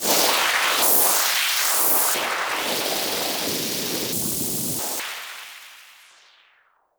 Giant Step; YAMAHA RM1X

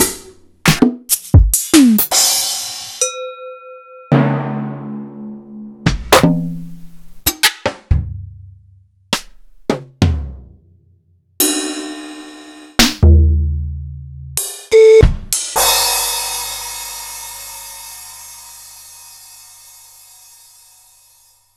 EMU PX-7; m8

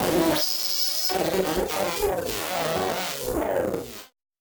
Malfo Syn, malfunctioning synth

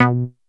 BASS EMU para Bass; Polyend; Legowelt

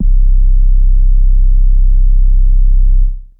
Bass Synths hits and octaves

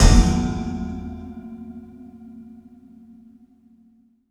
RACK STRIK, PERCMETALIC

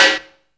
so high, snares; Zero-G; Funky Elements

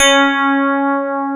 PIA DX E-PIANOS; Sound & Vision; Gigapack I CD 2; PIA DX-Pianos

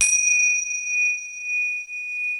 TIBETAN, TIBETAN BELLS; Crypton - DrumBase5000

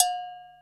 HIGH BELL, SUPER FUNK; Pro-Rec - Synthsonic

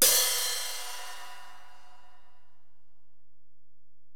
HiHat, Alesis DM-PRO

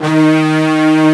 Section Brass D#2; Proteus 2000; Digital Sound Factory Proteus 2000

